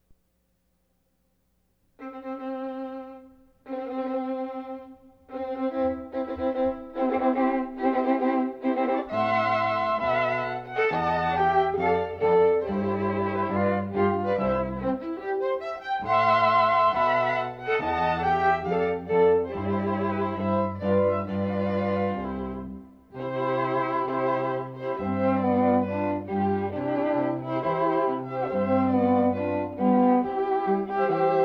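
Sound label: string quartet; Wedding March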